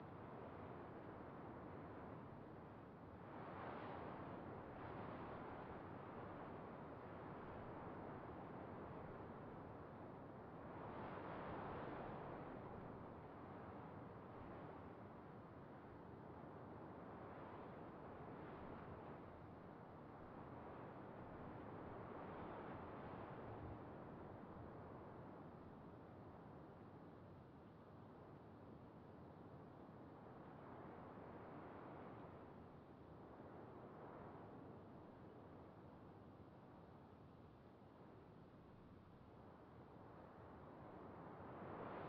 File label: wind general